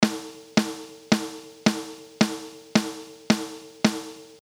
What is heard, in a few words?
Snare Sample DBX 160A